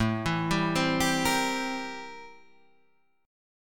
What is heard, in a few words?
A-Minor 7th Flat 9th, chord; A-7b9 Chord {5 7 5 5 8 6} arpeggiated